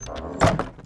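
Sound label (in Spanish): CERRAR PUERTA, close door; Yesterday Origins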